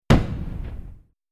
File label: Explosion